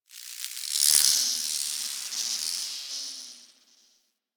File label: Insect Close; Ambience; One Offs; Warframe; Eidolon Plains